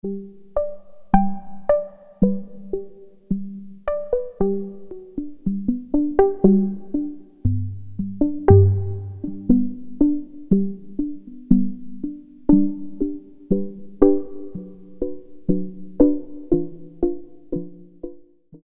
Pizzicato, Roland MKS-50; Factory examples

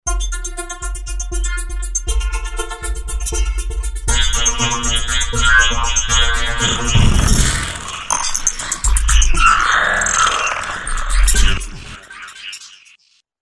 Pad, Roland V-Synth; Factory Patches; 062 TTPadMePleez

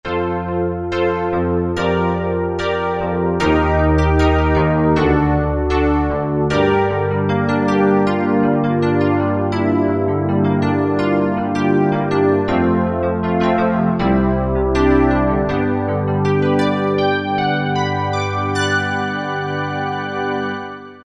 Pad Piano, Korg T3

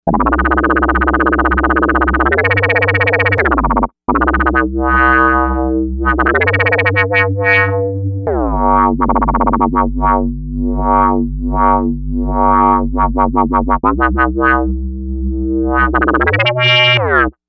Classy Dub; Novation UltraNova; Factory Patches

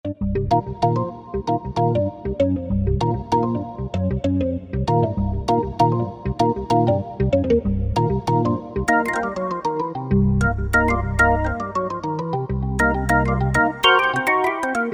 Clicky BX3, Organ

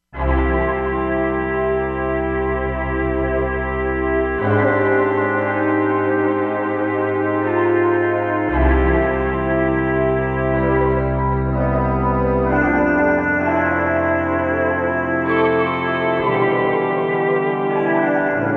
Autumn Pad; Korg MSC-16S Environment

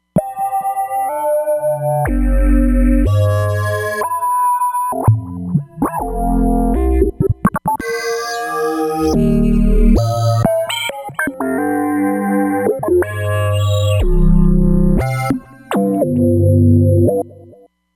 Alien Bubble, Roland Fantom XR

